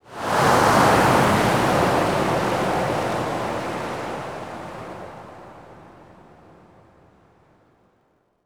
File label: harbor occasional